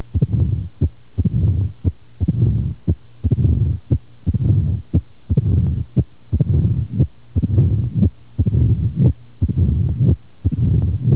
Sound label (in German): herzklappenfehler mitralstenose